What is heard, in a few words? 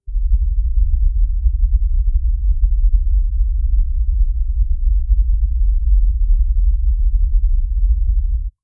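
interior room during earthquake, disaster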